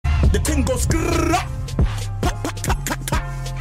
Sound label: Skkkrrrraaaaat